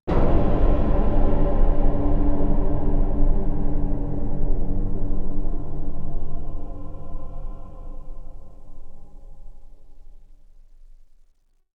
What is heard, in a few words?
Sting; CollectorsHouse; Dead Rising 3 Apocalypse Edition